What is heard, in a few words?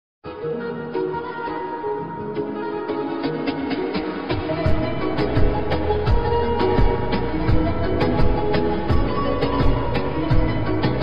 instrumental ringtone arabic ringtone trending ringtone